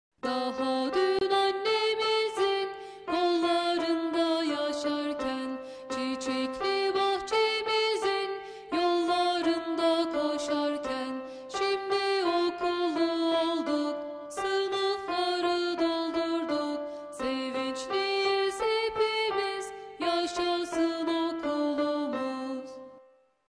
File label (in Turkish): Çocuk Şarkıları